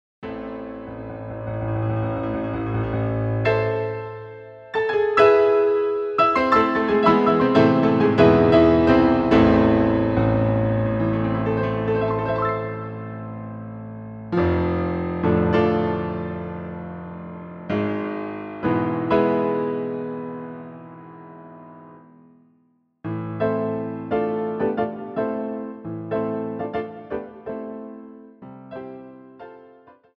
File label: Piano Concerto; Opus 16 1st Movement; Grieg